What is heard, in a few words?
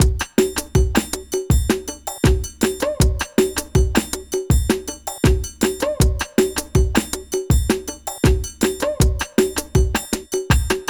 RAGGAE; INIS; Funky Xtreams